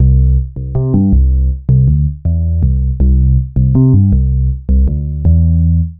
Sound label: Pure Bass